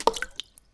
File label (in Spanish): gotita